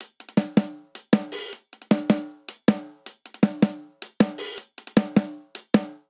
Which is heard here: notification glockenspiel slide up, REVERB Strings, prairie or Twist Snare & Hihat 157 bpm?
Twist Snare & Hihat 157 bpm